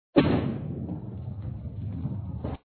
light Roll; tom jerry bowling

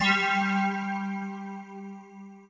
Pulsar; Cyclotronic Resonator; Ether Layers

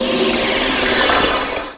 menace wait

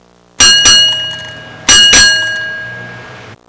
gas station

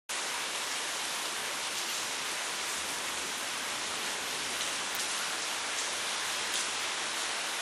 Rain

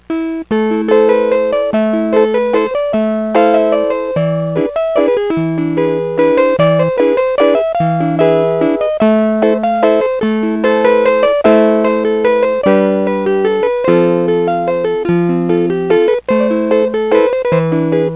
latn guit

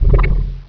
FAST FX; CD Action; mtg; DuelSounds

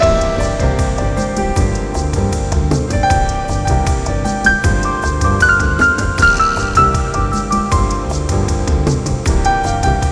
ambient music loop

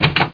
drop

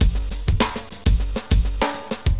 DM LOOP, HipHop, Drums

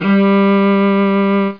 String G3; The Incredible Demo; Tim Audio

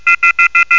phone off hook; aroundhome

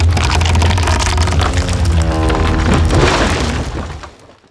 door open; DAoC

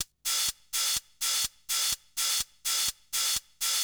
HIHAT HOUSE 125, Drum; eJay

